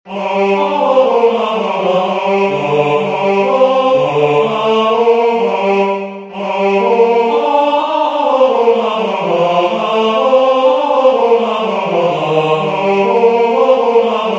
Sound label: Deposuit potentes bass